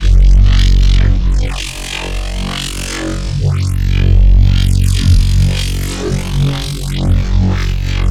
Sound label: Sound FX Low Laser SFX